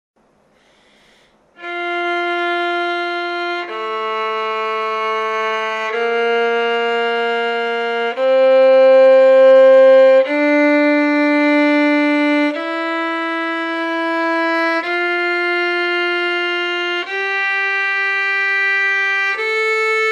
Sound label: scale arpegios F dur